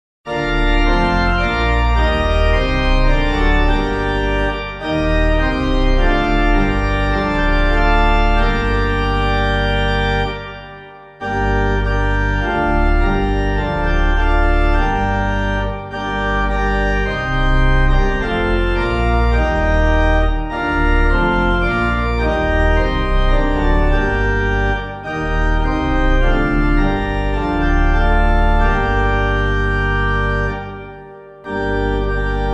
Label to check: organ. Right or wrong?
Right